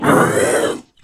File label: Mutant Pain; Cruelty Squad